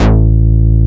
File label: A-BASS, AN BASS; AKAI S-Series CD-ROM Sound Library VOL-7